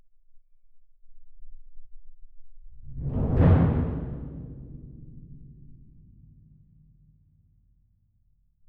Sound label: Twin R Perc